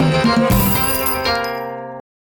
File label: free spin init; Amatic; Lucky Coin